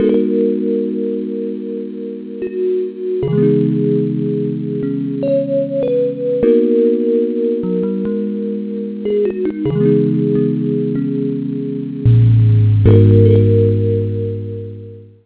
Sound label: adlib layer